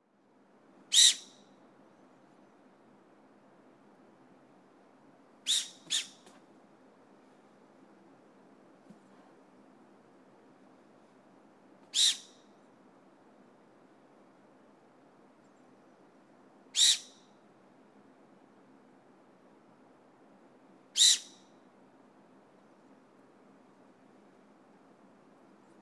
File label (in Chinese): 宜蘭福山 長鬃山羊